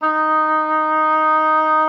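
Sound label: D#4, oboe d'amore; Xsample VOL-7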